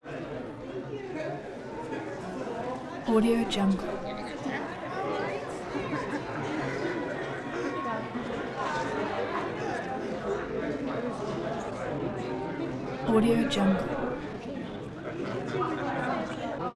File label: cafeteria walla movement reception in out; prosoundfx